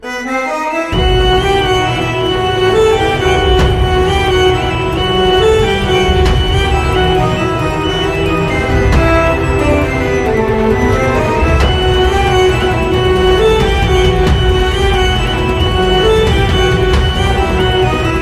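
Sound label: background Tone Telugu Ringtone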